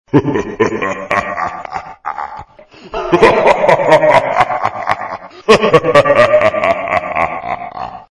Risada Diabolica, Vinheta

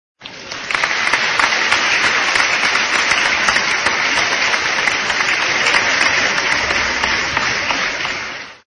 Aplausos, Vinheta

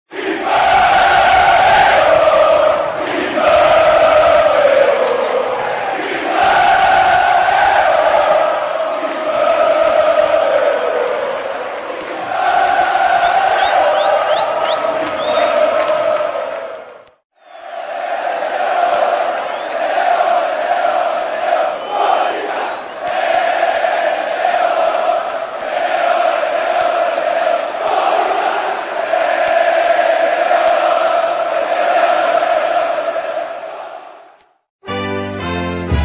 Grito De Incentivo, Vinheta; Gavioes Da Fiel

